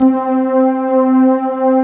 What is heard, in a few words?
mallets; Pro-Rec - Synthsonic